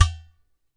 udu slap, Udu Clay Hand Drum; ETHNIC and WORLD PERCUSSION

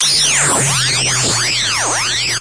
electric and annoying; Electro and Synthetic

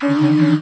dissonant voxish c

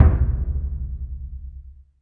big burundi, African Burundi Drums

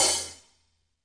hi hat, real live drum kit; REAL LIVE KITS; A Real Freakin Drum Kit

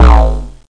blobble kick down; Kicks; single hits

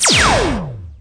Electro FX; Ueb HE Electro FX

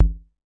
kick, drums single hits; iElectribe Kicks

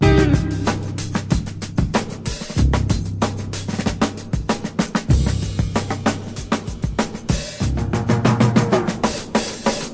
drum loops and breaks, 94 bpm; 091 to 100 bpm

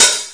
electro hat; Electro Kit; STYLE KITS (Hip Hop, Etc); Boy In A Band Electro Kit

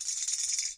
tamborine; Western and Latin Percussion; single hits

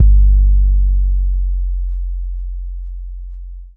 urban trap kick; Urban Trap Kit; Hip Hop; STYLE KITS (Hip Hop, Etc)

